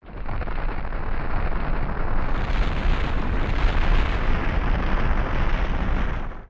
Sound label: Statue Crumble Full; Spirit Temple; zelda; OOT